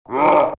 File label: pit drone communicate; svencoop; legends